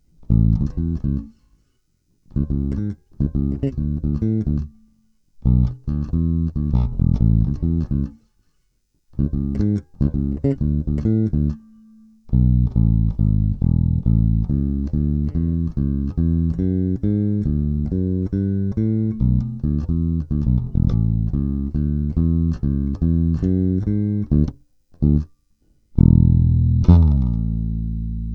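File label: 750G - Gold White Nylon, bass; La bella; Rotosound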